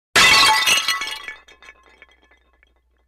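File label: Large Ceramic Pot Break; clay & ceramic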